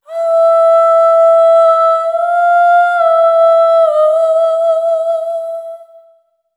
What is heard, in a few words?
Single Voice Melodic Loops, Vocal Samples